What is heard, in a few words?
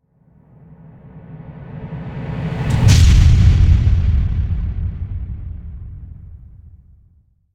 Whoosh Into Big Impact Explosion; Hollywood Edge; sound designer's toolkit v2